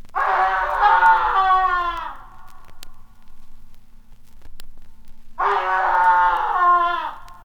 two screams - male

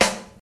nice steel snare drum sound g key; snare drum samples

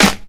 dry acoustic snare sound g key

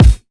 loud kick one shot a sharp key; Duration 0:01; Bitdepth 24; Channels Mono

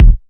nice bass drum sample d sharp key; Duration 0:01; Channels Mono; kick drum samples

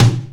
good tom sound b key

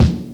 dry kick drum a sharp key

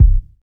boomy kick drum c key

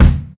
clean kickdrum sample d key

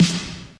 short reverb snare f key

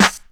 studio acoustic snare g key

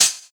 sharp hat sound B key; hat samples

